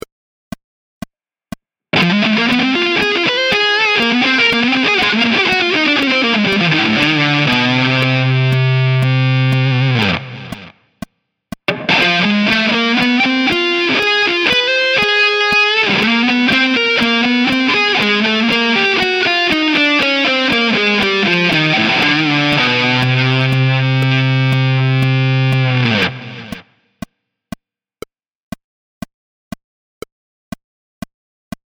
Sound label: chromatic riffs